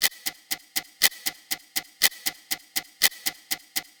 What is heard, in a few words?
loop clock